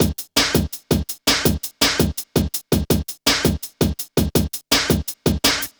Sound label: Klanga, drum loops 165.5; Jungle; Zero-G Jungle Warfare 1, 2 & 3; 90 s Sample CDs